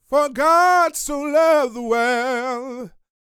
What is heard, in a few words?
Vocal Gospel; Vocal Planet Vol1 Gospel